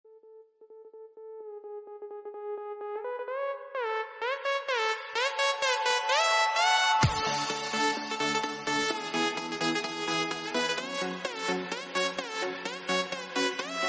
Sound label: pop ringtone